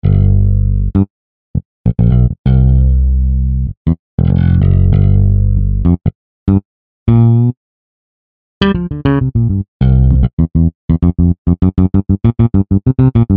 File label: Old Four Strings; IK Multimedia; SampleTank Free